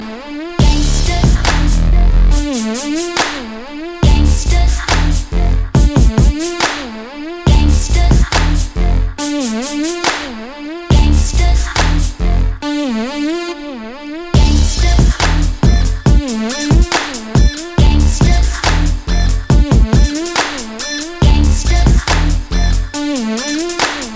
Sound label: gansta beat, hip hop ringtones